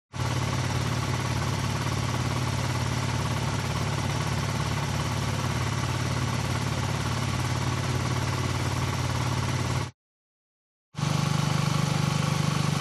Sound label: TRANSPORTATION MOTORCYCLE Engine Low Constant RPM Ascending; smartsound